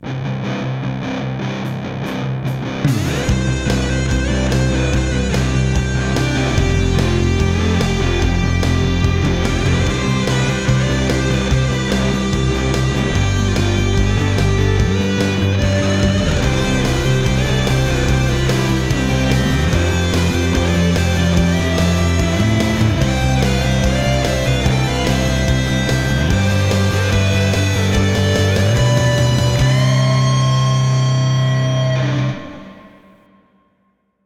punk

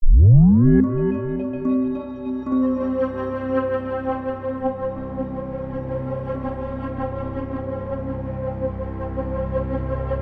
Horror Outro

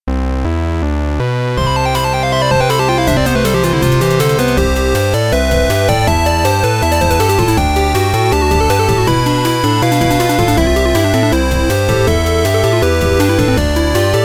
8Bit

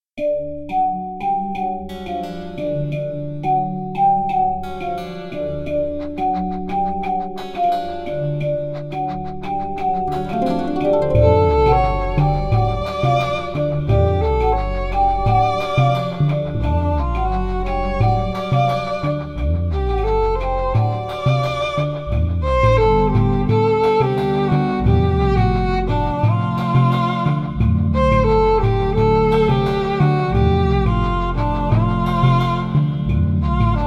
raga; scriptnotes; jog

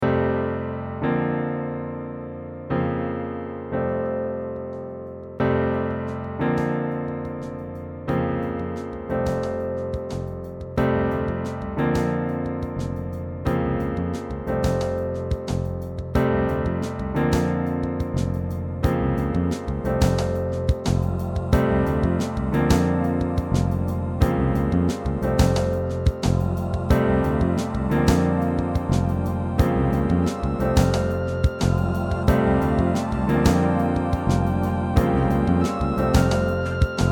Waterfall

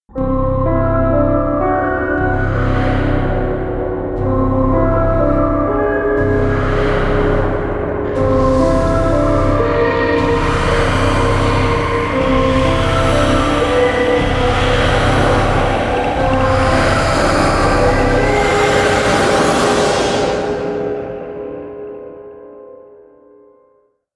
Horror

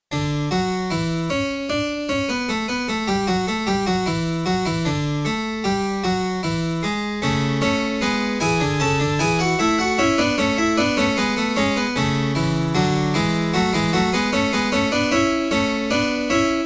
Baroque Fugue

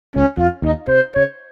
intro bloops short

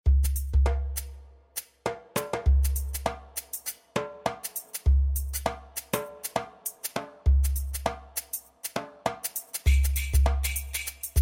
Funky Gospel

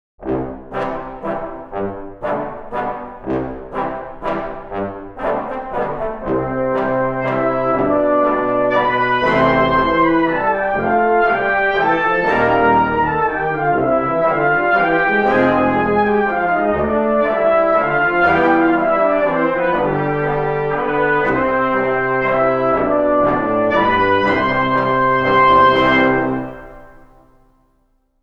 Waltz; Scriptnotes

